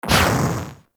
Grenade Explosion; Warframe; Caliber Chicks Minigame; Bomber

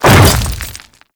Hammer Boss Step Dirt, Footsteps; Warframe; Grineer Hammer Boss

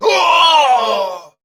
Death; Warframe; Dax Ashigaru; Battle Barks

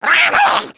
Attack Effort; Grineer Jester; Warframe; Battle Barks

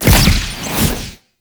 Explosive Impact; Warframe; Weapons; Akarius Prime

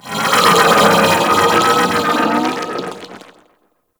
Vocal Idle; Warframe; Amalgam Override

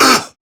Pain, Male

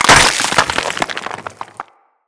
Container Open; Gallium; StorageContainer; Material Specific Loot Containers

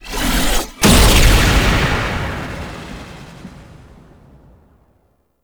Revive Power Boost; Warframe; Tenno

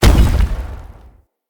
Blunt Heavy Impact Vegetation; Weapon Impacts; Player; Environment

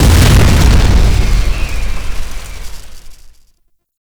Shock Rifle Alt Explosion; Warframe; Weapons; UT Weapons

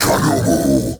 Cast Vocal; Warframe; Uriel; Demonium

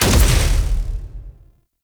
Blitz Activate; Warframe; Eximus

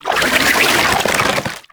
Key Pieces Tumor Shell Attach; Encounters

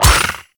Melee Hit; Warframe; Voruna

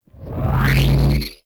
Impact Warning; minigame; boss; Warframe; Caliber Chicks

